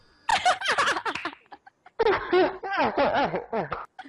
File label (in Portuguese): risada